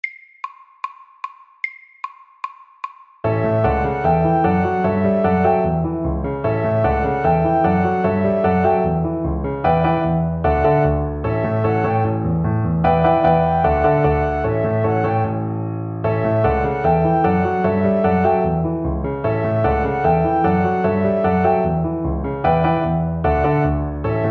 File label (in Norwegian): Honky tonk MIDDELS